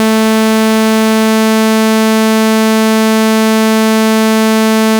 sawtooth

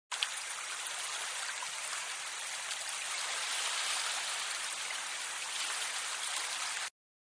Small stream

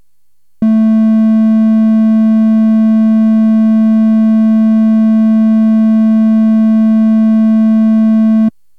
Triangle

